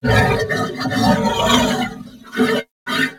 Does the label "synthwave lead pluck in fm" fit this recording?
No